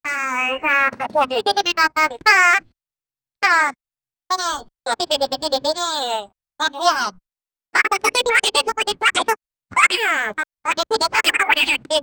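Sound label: cute cartoonish, amazement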